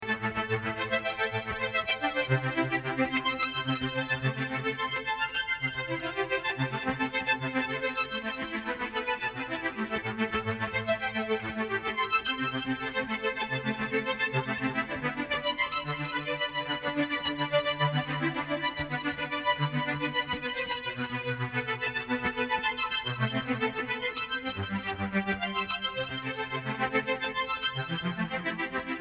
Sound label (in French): violon